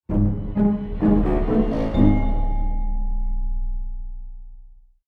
Stingers; The Dwarf Run; EV First Soultrapped Appears

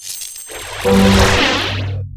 Washing Machine Appear; raving rabbids travel in time wii gamerip 2010

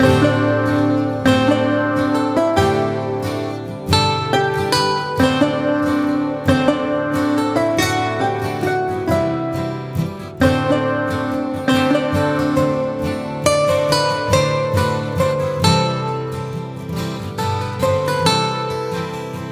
Cutscene; Party Fowl; Cooking Dash

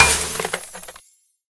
Prize Egg Tap Glass

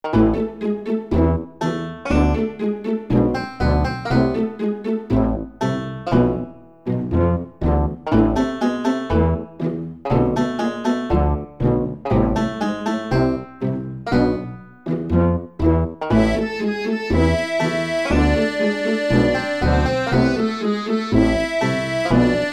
Intriga Banjo Garmon Loop; Mama Don't Cry

